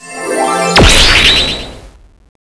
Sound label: magic mg, weapons